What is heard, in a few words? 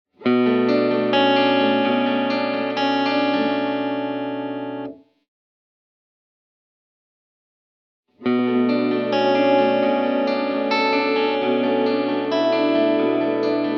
Vintage Oil Can Echo, Warm and heavily modulated echoes quickly swirl below a bright guitar tone; Departing Optimism; Olivera